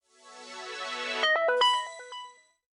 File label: Power On Feature Phone